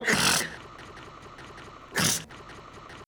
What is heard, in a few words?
snooze old